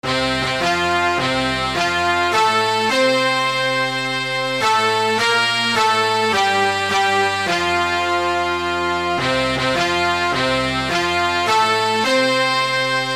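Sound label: Instrumental Nigeria National Anthem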